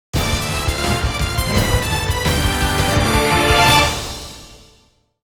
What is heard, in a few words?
Victory Theme; Mii Fighter